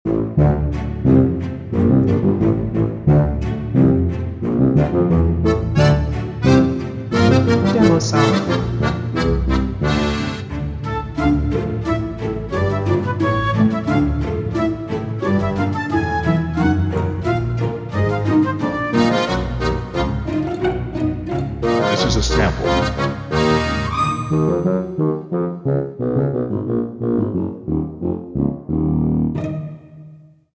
Quirky Little Tale Bassoon Accordion Flute

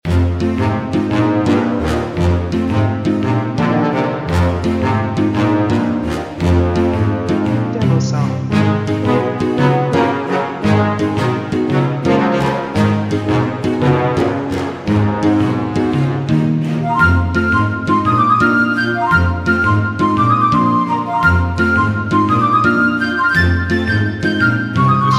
A Lively Playful Mood, Loop

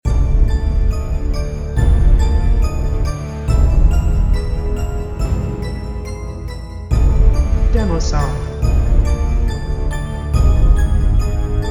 Mysterious Dungeon Walk Loop with Flute

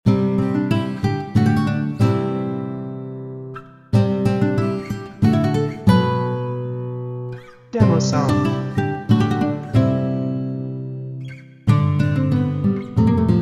Loop Guitar Only

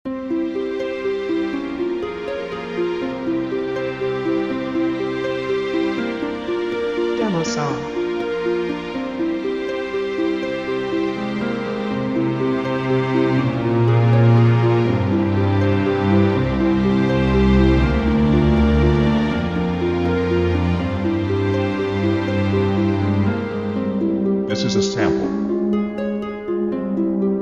Bright Adventure of Dreamy Majesty

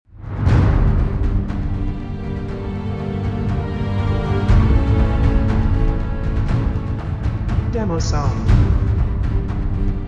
Dark Epic Power Trailer Sparse Choir Shouts

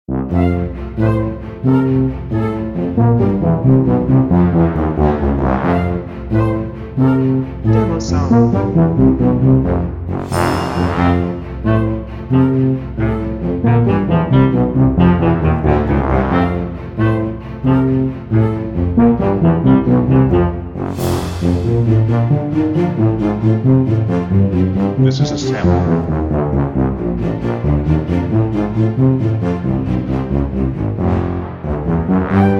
Bouncy Flute and Funny Tuba

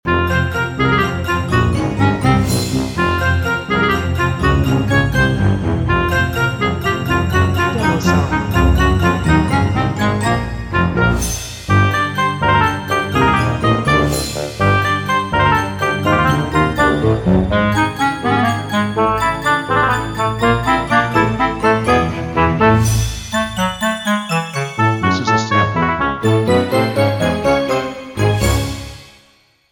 Funny Clarinet Madness

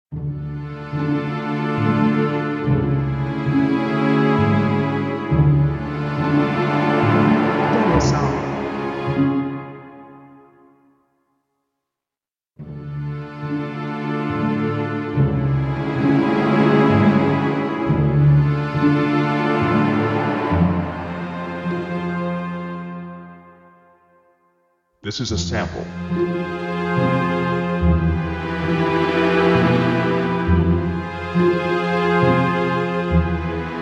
Sneaky Tension Soft Fade

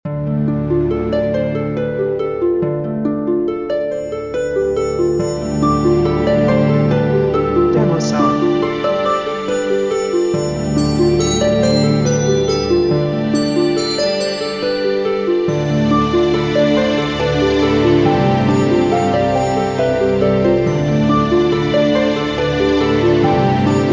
Peaceful Heights of Tranquil Beauty Loop

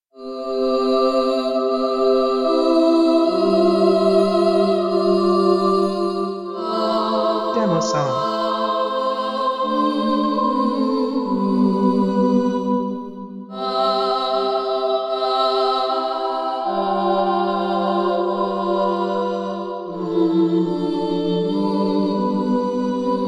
Hymn to the Creator

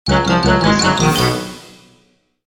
Playful Snippet Down Cymbal

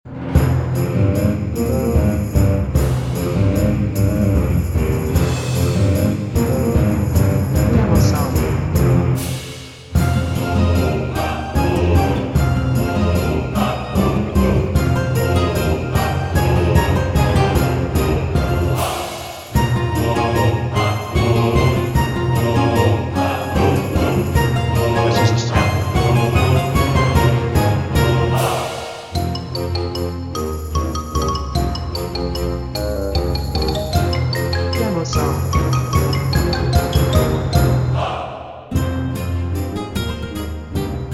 Choir with Intro

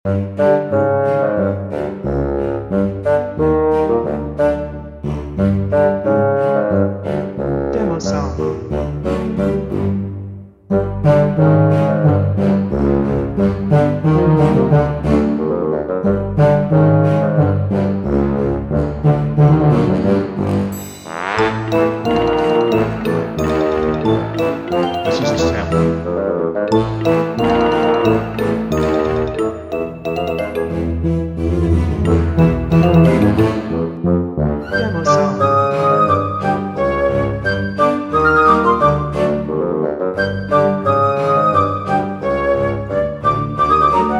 Trickery Tricks Loop

